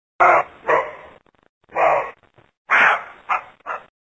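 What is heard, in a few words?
roe deer; Краткие сведения об основных охотничьих животных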